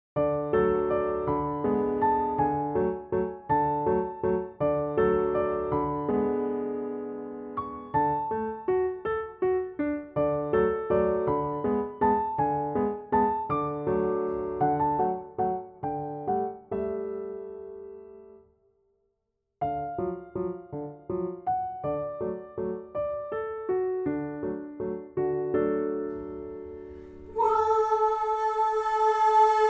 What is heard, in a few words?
One Girl Soprano